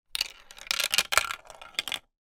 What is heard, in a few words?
toy marble run marble down plastic track